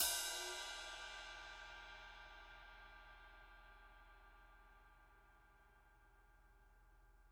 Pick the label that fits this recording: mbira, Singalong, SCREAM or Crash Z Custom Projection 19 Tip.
Crash Z Custom Projection 19 Tip